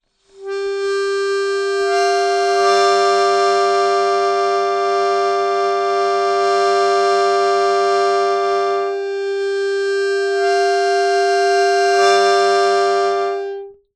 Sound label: Clusters, Melodica